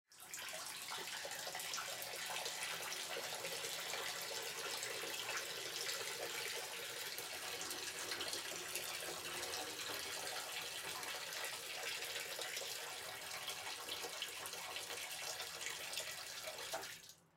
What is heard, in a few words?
Human Urinate Pee; Urinate Pee In Porta John Toilet Element Male 25 Yrs; Urinating; Blastwave